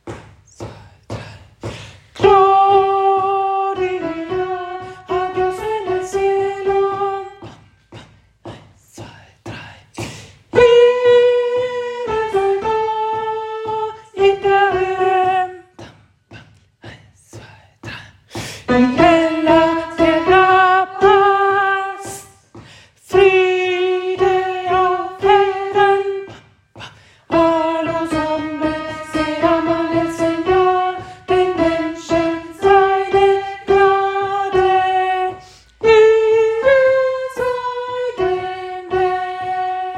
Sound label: Gloria Alt